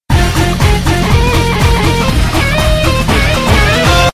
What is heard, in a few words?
pre minigame; tf; microtf2